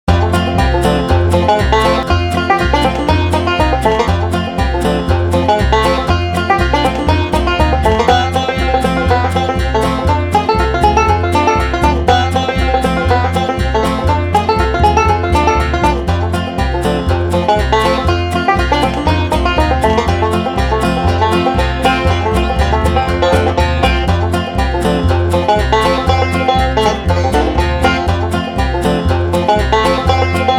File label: Juke Box Country blue grass